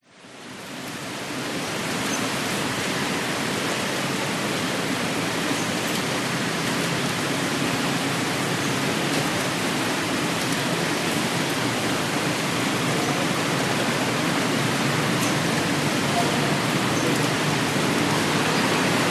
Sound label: nature rain heavy city thailand chiangmai light traffic bg condo